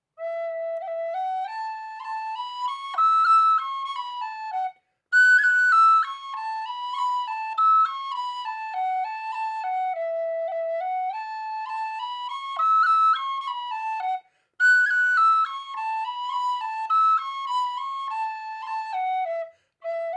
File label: Kerry Fling Slow